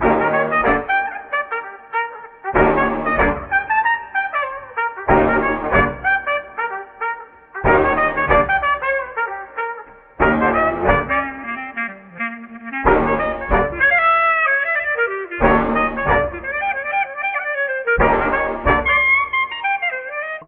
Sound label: horns roaring twenties, Phonky loops